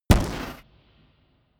gun pop gun shot

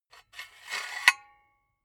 silicone gun empty pusher pull out slow

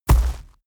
hellhound step; schritte

